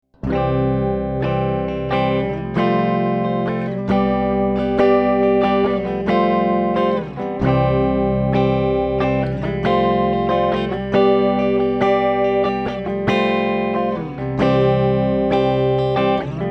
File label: strummy flangy guitar in C